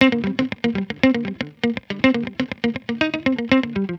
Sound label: 120 NuJazz Guitar Cmaj, Jazz Guitar Loops; Pro Tools Essential Loops; Digidesign